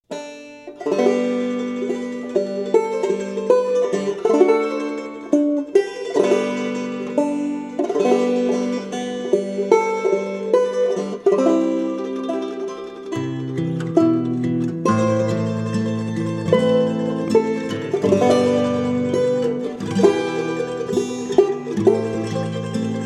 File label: dixie hymn